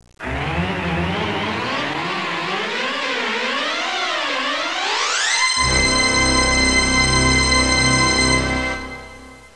theme

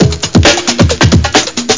FUNKY BET; Groovy Bytes: Behind the Moon; DRUMLOOP

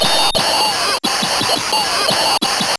fight

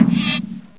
HIT; Terra; TERACIDE

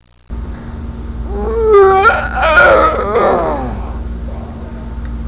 WUWU; Talking E-Mail

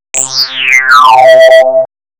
sweep

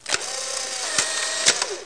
Polaroid Shutter Wind

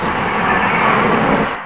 ramp

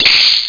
Bolt Hit; BOOM

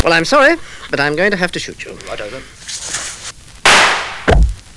shoot

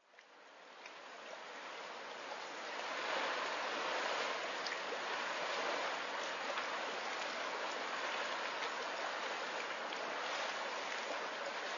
water; PHARAOH

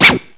scratch; Octamed 6; walkabout; fx perc

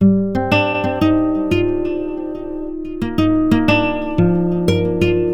Guit; GUITAR; 90 BPM

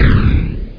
ice fire; Hexen II; crusader

